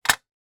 household audio cassette tape case put down on table